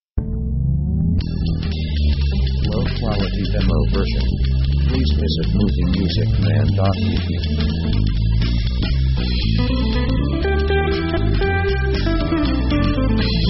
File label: fastfood, fast